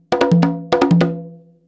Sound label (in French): frise, congas